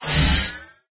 Action; MapleShrine; mkxp; gameasync

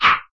Swing; MapleShrine; mkxp; gameasync

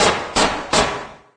stair; MapleShrine; mkxp; gameasync

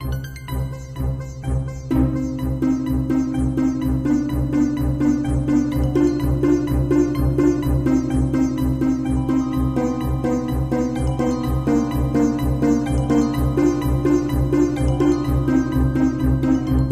Negative; MapleShrine; mkxp; gameasync

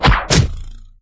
Attack; MapleShrine; mkxp; gameasync

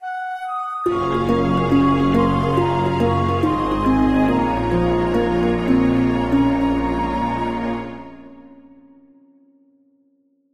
Defeat; MapleShrine; mkxp; gameasync

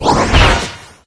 Remedy; MapleShrine; mkxp; gameasync

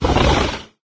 Action; MapleShrine; mkxp; gameasync

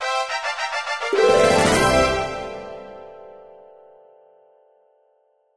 Victory; MapleShrine; mkxp; gameasync

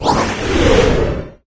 Remedy; MapleShrine; mkxp; gameasync

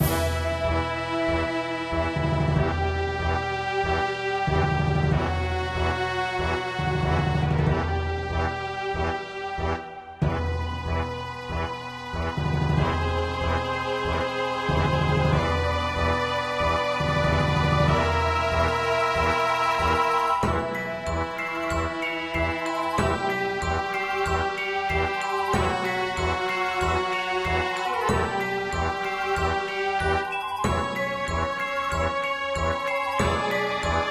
dungeon; MapleShrine

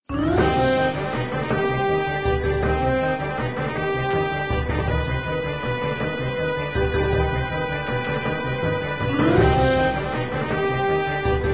Battle; MapleShrine

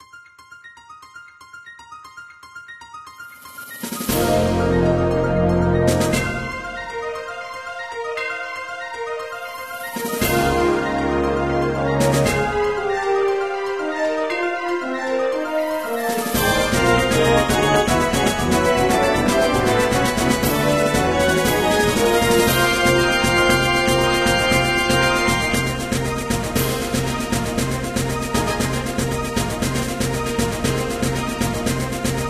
Last Boss; MapleShrine

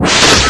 door; MapleShrine; mkxp; gameasync

